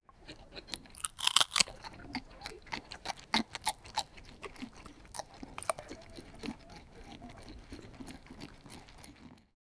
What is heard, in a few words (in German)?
ein Hamster isst eine Kar, carrot